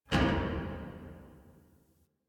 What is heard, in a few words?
Parking Lot Metal Door Distant; Ambience; Warframe; Nineteen Ninety Nine